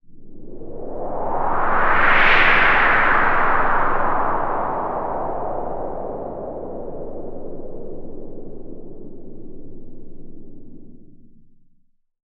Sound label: FX, Roland MKS-80